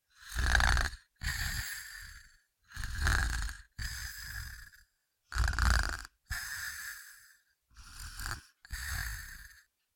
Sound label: Cartoon little child voice snore snoring